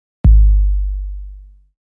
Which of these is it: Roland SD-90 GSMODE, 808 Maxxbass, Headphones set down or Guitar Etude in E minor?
808 Maxxbass